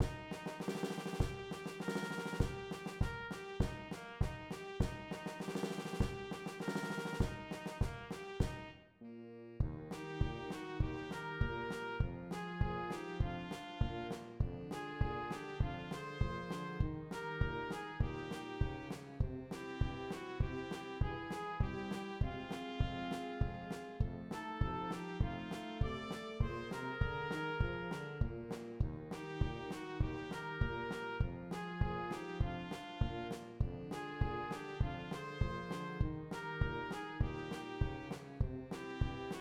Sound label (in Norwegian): trommer